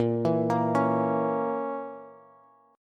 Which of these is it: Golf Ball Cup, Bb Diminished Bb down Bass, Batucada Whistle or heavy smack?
Bb Diminished Bb down Bass